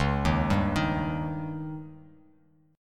C sharp Suspended 2nd Flat 5th C sharp Bass Standard; C#sus2b5; arpeggiated; JGuitar